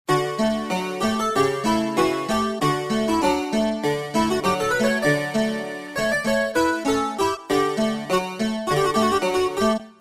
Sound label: Harpsichord, Casio CSM-1; Tones